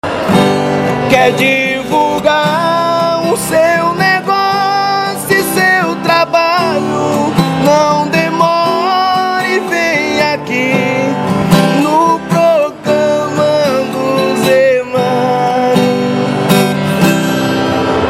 vinheta cantada

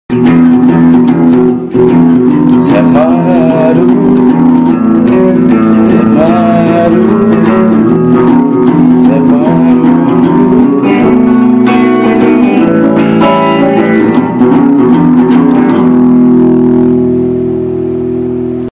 vinheta cantada GUITARRA

